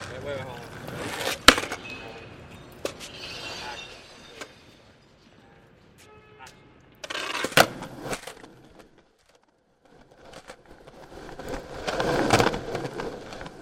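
skateboard riding on the street and performing tricks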